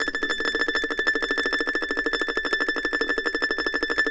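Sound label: mandolin tremolo A6; Sampleheads; Whole Lotta Country 1 & 2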